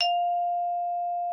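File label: xylophone F5; Xsample VOL-4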